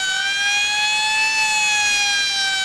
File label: fire truck siren